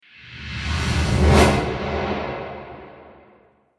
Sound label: Flashback Transition Whoosh